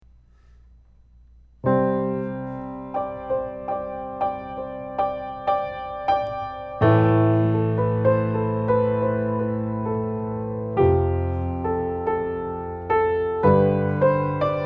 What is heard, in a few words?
Piano, UM25; AMI UM25Proto